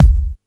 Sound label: kick single hit g key